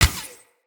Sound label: Elemental Radiant Arrow Explosion, Bows; Weapons